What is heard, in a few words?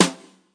BRUSH SLAP, Roland TD 7; DRUM MACHINES